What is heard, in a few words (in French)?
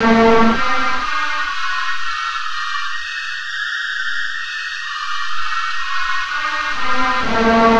tube trou; ModelesPhysiques